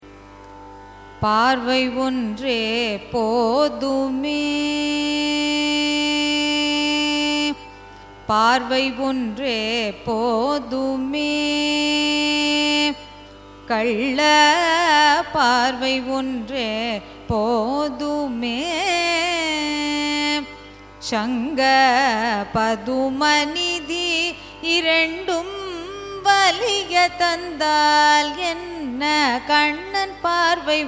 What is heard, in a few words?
raga surutti carnatic; carnatic music